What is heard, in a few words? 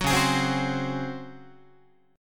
C sharp Major 7th Suspended 4th C sharp Guitar; C#M7sus4 strummed; JGuitar